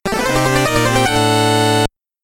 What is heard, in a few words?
Slain-Long; Carpathian Night Demo 2019 Halloween